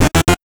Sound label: Transform up; Sony Ericsson; F305; PlusFourSix; Ying; Paul Bergman